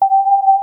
energy detected, ships combat

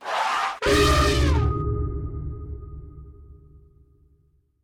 sonic, enemy, combat; baurg

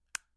smartphone hold button; telephone; CosmicRage

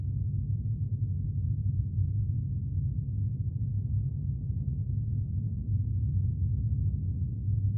holodeck, ships rooms

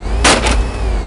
plastic, trolley; CosmicRage

